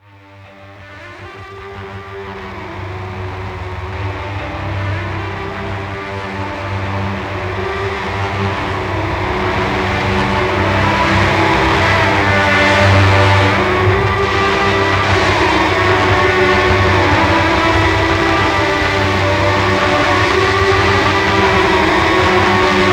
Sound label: Japanese Distortion Theme